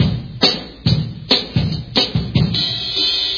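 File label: drum loop measured noisy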